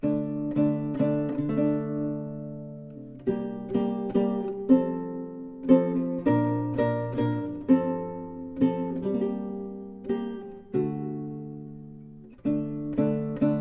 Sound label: Blame not my Lute